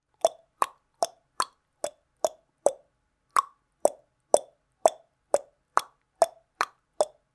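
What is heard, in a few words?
clinking sound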